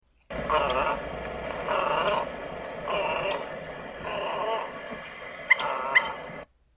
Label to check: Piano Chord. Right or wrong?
Wrong